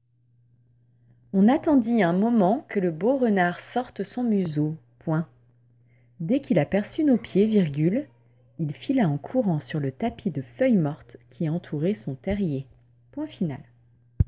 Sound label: Vocal